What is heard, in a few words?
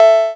Claves, Roland JX-305